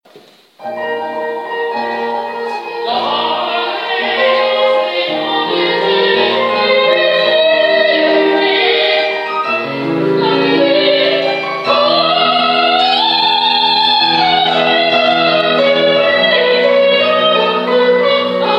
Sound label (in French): Carmen soprani partie 2; La Fumée Carmen de Bizet